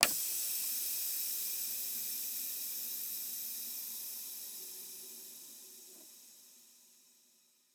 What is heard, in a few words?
Semi Truck Brake; Large Vehicles; Blastwave